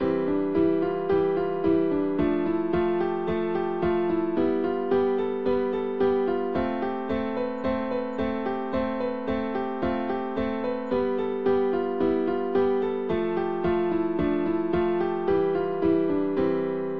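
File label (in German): Fingerkraft Übung, klavier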